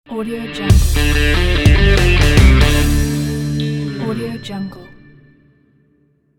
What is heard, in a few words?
cowboy logo